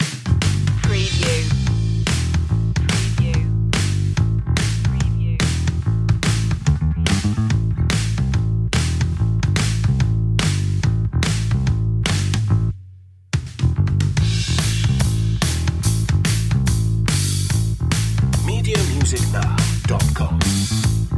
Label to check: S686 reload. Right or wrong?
Wrong